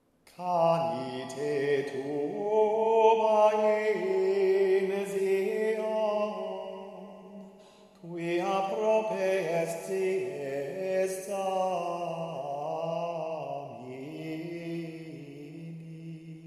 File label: Canite tuba